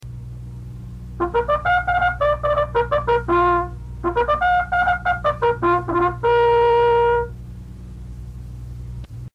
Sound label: First Call To Colors, Bugle calls